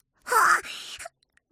DOULEUR; mirumiru

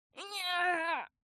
Herisson Efforts Longs; mirumiru

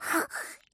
JUBILATION; mirumiru

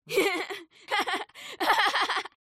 Iguane Rire; mirumiru

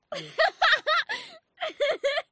Grenouille Rires; mirumiru

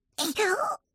DOULEUR; mirumiru

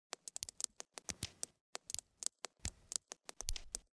Pluie; mirumiru

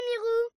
Chauve Souris; mirumiru

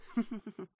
Raton L Aveur Rire Etouffe; mirumiru

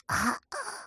ETONNEMENT; mirumiru

